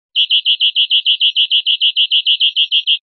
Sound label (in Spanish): chones corridos enrabietados, verderon